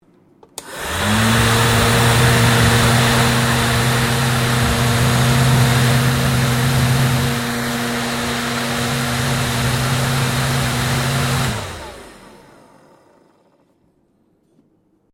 POPCORN MACHINE ON OPERATING OFF; Various kitchen appliances; Sound Effects:Household

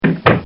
Cartoon Sound Effects Fall; Cartoon Sound Design

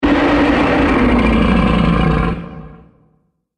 Scream Huge Monster, Monster Sound Effects

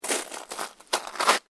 SNOW SCRAPE

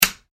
household switch light flick; Light Switch ( Wall Mounted ) Single Click

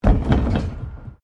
PLASTIC BARRICADE MOVE

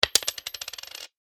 money coin drop, Coin Drops Onto Table; Coin ( Single ) Drops Onto Wooden Table; foley; Objects; McKinney Sound

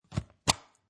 Rubber Stamp Once, Office stamp, stamping paper; Classroom; Schools; LynneMusic

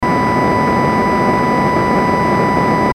static electric; Static Electrical Hum; Production Element Imaging Element Accent Transition; Blastwave

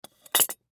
Scissors Set Down, Scissors Set Down Table; Offices; Foley; Blastwave

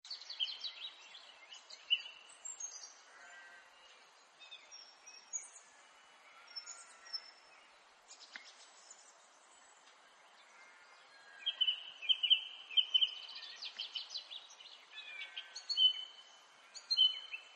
Pastoral Ambience; Nature Scenes; Environmental; Pastoral Ambience 1

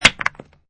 Stone, Rock pieces being thrown on the ground; Rocks; Materials

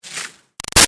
Arrow By Ruffles Fast; Bows and Arrows; Sound Effects:Combat; Big Room Sound

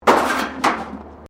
METAL BIN SCRAPE CONCRETE

